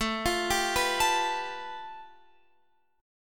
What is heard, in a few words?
A 7th Suspended 2nd Sharp 5th A, Guitar Open F; A7sus2#5; arpeggiated